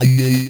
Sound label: Vocalish, 8-Bit; Games And 8-Bit Kit Volume2; atomsplitteraudio; chiptune